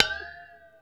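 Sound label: ART SHELL, percussion metallic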